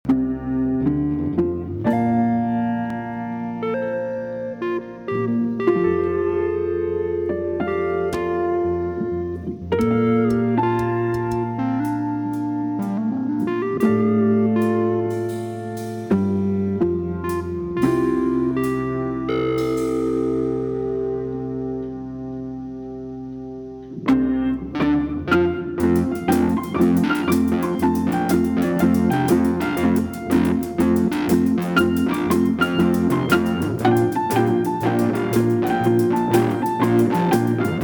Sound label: old piano; BELFIORE-BOXEN; Fredrik Belfiore